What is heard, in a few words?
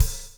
BLUES TK, Backbeat; Spectrasonics - Backbeat